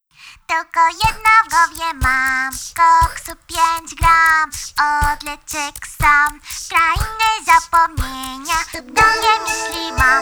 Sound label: Acapella loli Cover